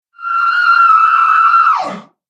Female Monster Scream Ogre

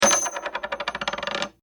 Ring Drop Foley Spin; Drops; Objects; BBFX

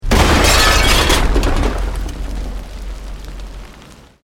Explosion Medium Brick Wood Glass

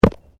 Body movement Fall Medium

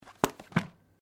Book Place; Book movement; Offices

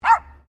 Animal Dog Poodle Miniature Bark; Various domestic dogs; Dogs (Sound Effects:Animals)

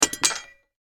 Knife Drop; Warfare; Knives; Combat

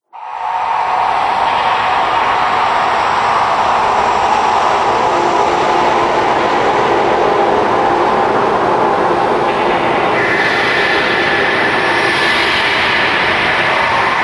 Alien Winds Drone

